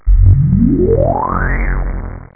Hyper Space; Offender; Poor Sounds

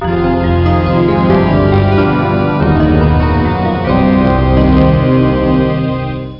eerie music; calvin & hobbes

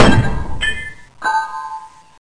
loop

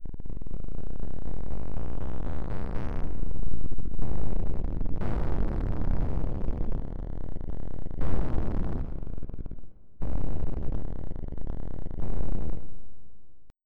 rumble

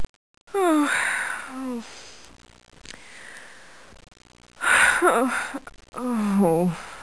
Woman Sighing; Soundsation; Human

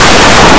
armoir; Blue Heat

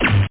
laser; Geschicklichkeit; Enigma

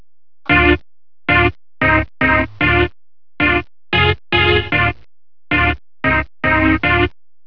boom

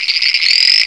frog; Delta Force Black Hawk Down

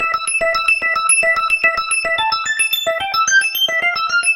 organ arp

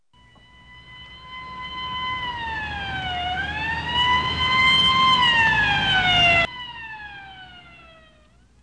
Siren

